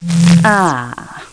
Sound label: Pickup Health